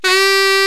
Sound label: SAX COMBO